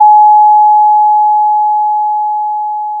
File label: Sound of a school bell